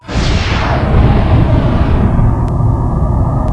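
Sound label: Wormhole Open; DS9FX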